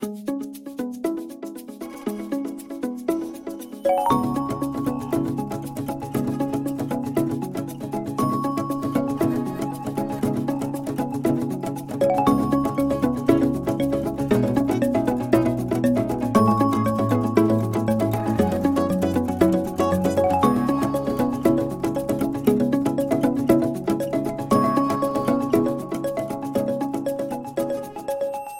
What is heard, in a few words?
Cisco Ringtones